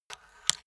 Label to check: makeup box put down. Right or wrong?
Right